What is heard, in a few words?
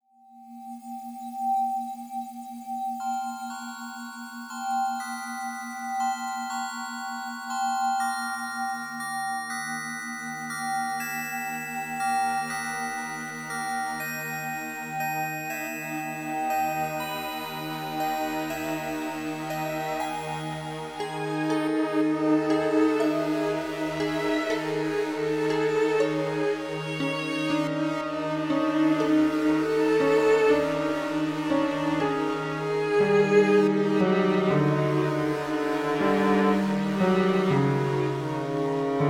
Mysterious